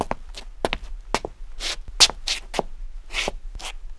kroky; Paulie kroky; Mafie 1 cz

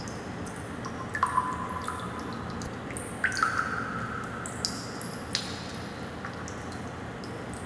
kanál; Mafie 1

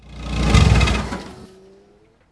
vypnuti motoru; Mafie 1

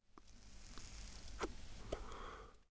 cigarko; Mafie 1

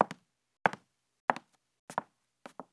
chuze drevo; Mafie 1 cz

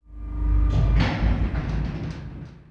trezor; Mafie 1

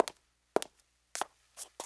doslap zena; Mafie 1

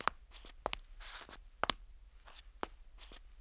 kroky; Mafie 1

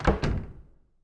dvere zavreni; Mafie 1

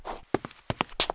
gang krok; Mafie 1

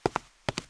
krok; Mafie 1

